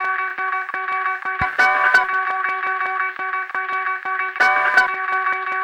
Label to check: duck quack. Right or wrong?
Wrong